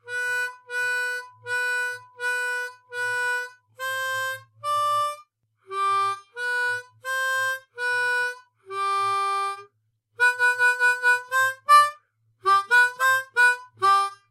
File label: Riff; poly rhythm